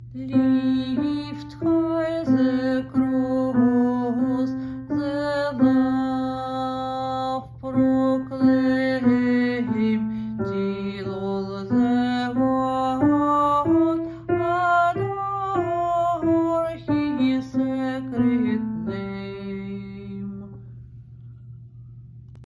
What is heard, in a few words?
ALTO; StA 298 Lift High